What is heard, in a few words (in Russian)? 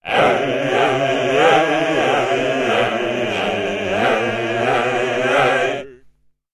zvuki s effektom zaversheniia, okonchaniia